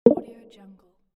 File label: wrong